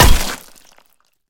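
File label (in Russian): четкий резкий короткий удар баскетбольной битой по мячу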